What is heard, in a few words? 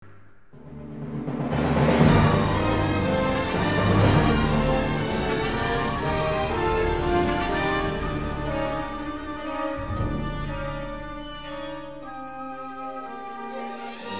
instrumental